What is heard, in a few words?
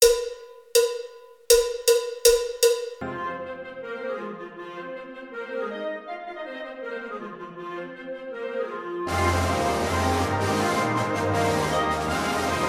Spanish Dance